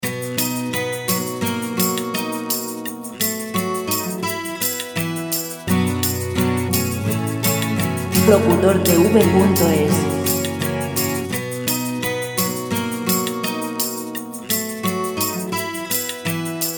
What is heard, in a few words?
guitar